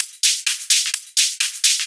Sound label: Hihat Loop 128 BPM